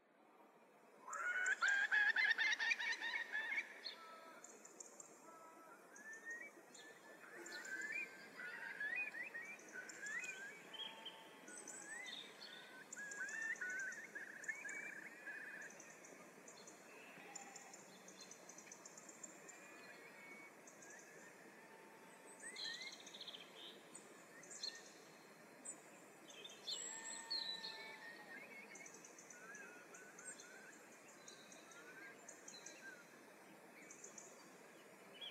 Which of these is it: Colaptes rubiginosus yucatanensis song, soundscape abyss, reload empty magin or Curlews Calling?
Curlews Calling